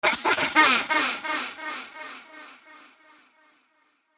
Evil Child Laugh Horror Sharp Demented, Evil Child Laugh Demented Echoes; Dark Scary Ominous; Evil Laughs (Sound Effects:Horror)